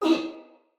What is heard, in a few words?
Pain, Male, en; Sinecure Banneret